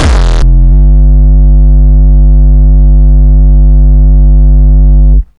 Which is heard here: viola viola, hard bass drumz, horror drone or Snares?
hard bass drumz